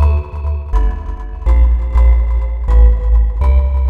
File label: D 123, Synth Loops, Acidized; 123bpm; Zero-G; Soundclash Nu Dance Electro